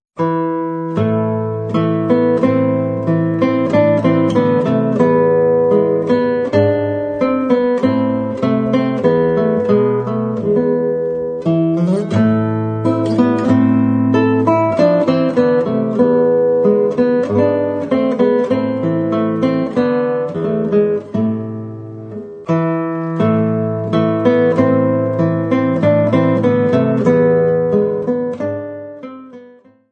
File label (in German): Lullaby, brasilianische Volkslieder; Saitenwege 1